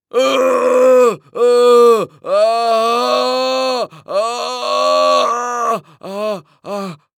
Human Male Yell Grunt In Pain

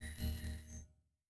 Transition Communication Distortion Scanner

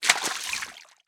Liquid Water Liquid Splash On Floor

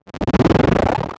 Title Transition Delay Short

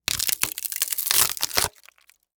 Crash Wood Crunch; Crashes

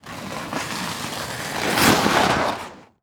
Weather Snow Duffle Bag Dragging On Snow

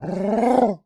Animal Mammal Carnivore Dog Bull Terrier Growl; animals; AdobeCC

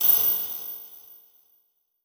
Transition Hit Impact Ping; Production Element

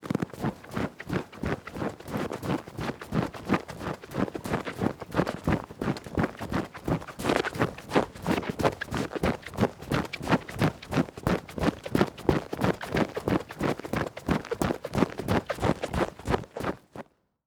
Weather Snow Footstep Running With Boot Squeaks; AdobeCC

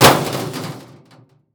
Crash Impact Car Crash Hard Hit; crashes; AdobeCC

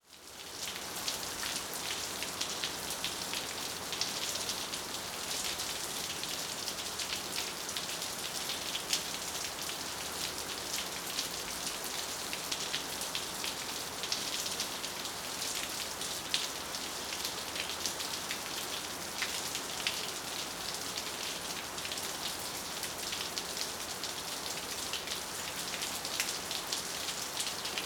Weather Ambience Rain Drips Water Splatty; AdobeCC

